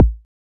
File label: Kick Drums; Drum Hits; Nu Dance Electro; Zero-G; Soundclash